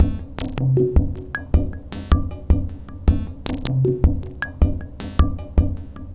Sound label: Modular Loop, Modular Percussion; Sonic Foundry (Sony Creative Software); Synchro Funk